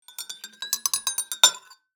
Tea spoon light clinking; kitchen

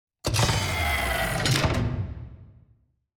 Sci-fi door sliding closing

